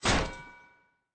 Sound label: deer; Santa Run